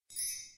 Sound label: click; fairies